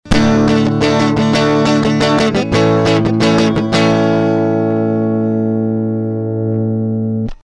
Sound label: gtr Gain max Out max limiter; Behringer MIC300